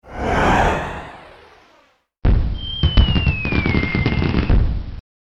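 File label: huojian, rocket; zhengbasai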